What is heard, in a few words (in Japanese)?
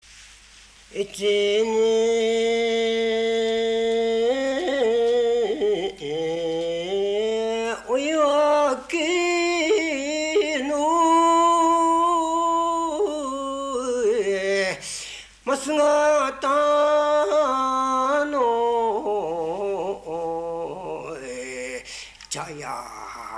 boshuoiwake